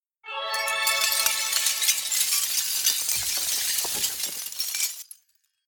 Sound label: sync sound; wbg games stable; progressive jackpot diamonds